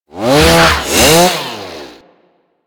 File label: Ghoul Sawman Lunge Attack; Warframe; Grineer Ghouls